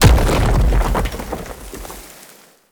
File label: Octopede Unearth Proj By Explosion; Warframe; ManInTheWall